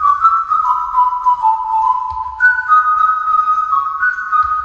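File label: whistle, 103 bpm; melodic loops; synth and electronic loops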